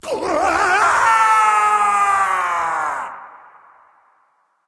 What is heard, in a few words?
nv fear; smite; awilix